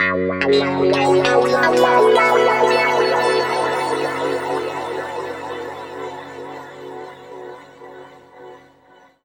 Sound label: F# P-S WA -R, Guitar Samples; East West; Steve Stevens Guitar Samples Collection; PTCH-SHFT+WA